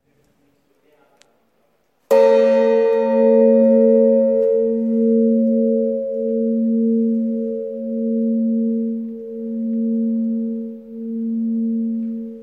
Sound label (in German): Glocke; Schueren